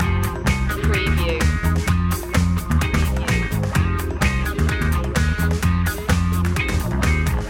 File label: get up loop